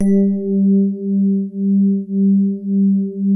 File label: SWEETER G2, E-PIANO; Music Store - Claudius Diemeter Schwerlos